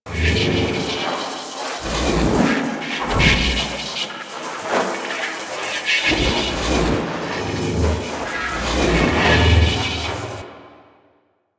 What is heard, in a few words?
Nanite Swarm Loop